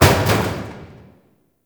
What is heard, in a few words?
Destruction Small Metal Hits; Ambience; Warframe; Corpus Railjack